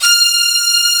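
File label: strings section F5 right; STRNGS; AKAI S-Series CD-ROM Sound Library VOL-8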